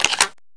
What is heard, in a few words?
reload shotgun Shell